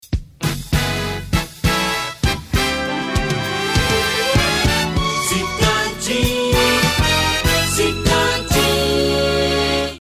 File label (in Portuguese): Vinheta; Cidade FM